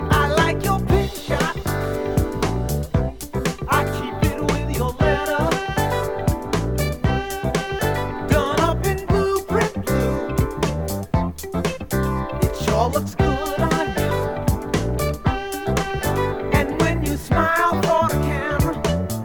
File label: Audio-Technica AT-LP120-USB Turntable